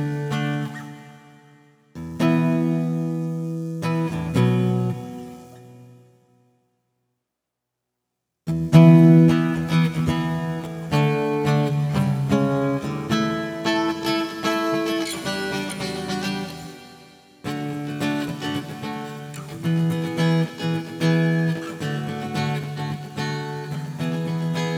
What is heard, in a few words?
Acoustic Guitar